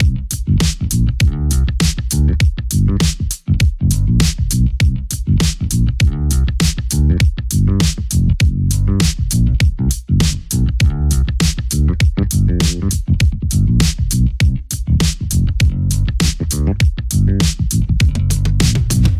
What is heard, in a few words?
badass groove; netent